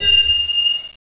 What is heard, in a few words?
wink; tfa cso; budget slayer